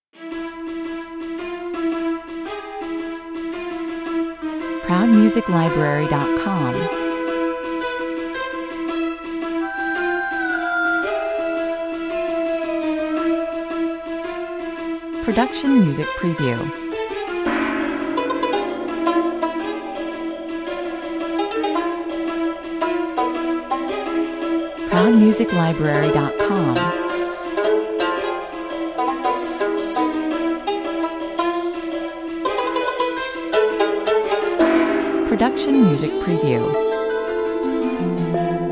persian duel (no drums) 112 bpm

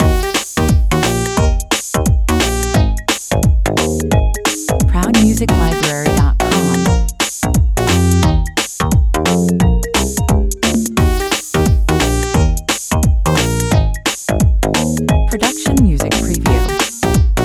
retro arcade, 88 bpm

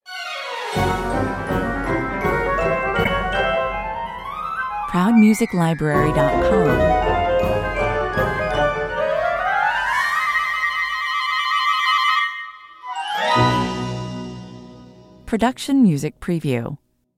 cartoon magic sequence, Fanciful, Enchanting

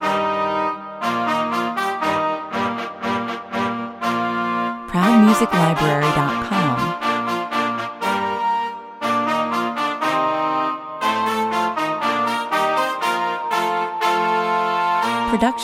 duet andante fuer zwei hoerner 120 bpm

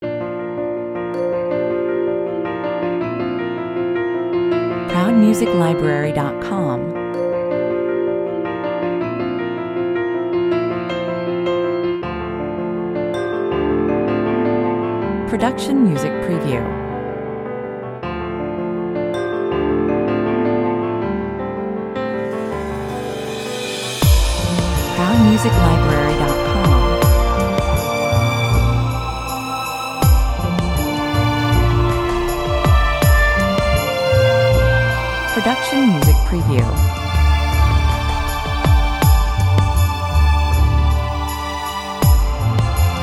slow orchestral dream

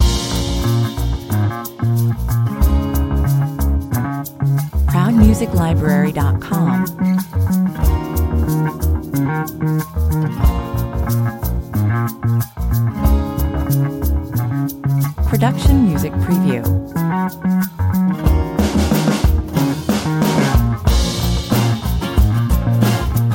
rock, 92 bpm

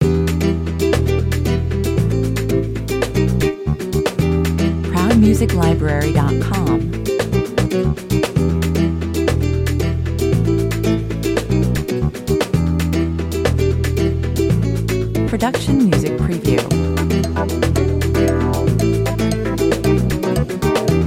loop, 114 bpm

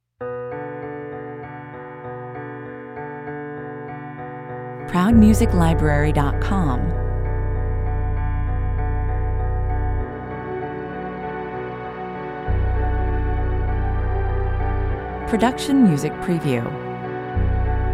no drums no low melody

